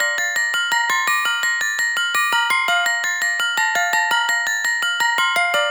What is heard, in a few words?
pling, lead arp chord melody